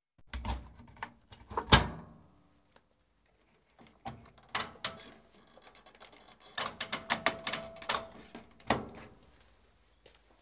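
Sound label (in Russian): стиральная машина закрывают дверцу установка программы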